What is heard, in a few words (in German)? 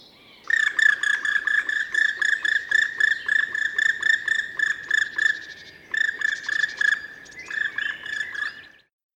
Schwarzspecht Flugruf